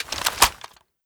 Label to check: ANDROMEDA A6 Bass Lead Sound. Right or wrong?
Wrong